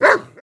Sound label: Dog Bark Yelp Lower, golden setter